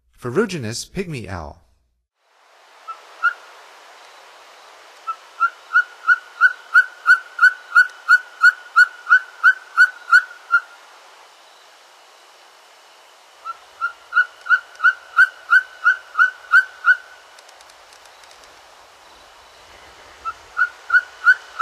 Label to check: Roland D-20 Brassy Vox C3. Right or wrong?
Wrong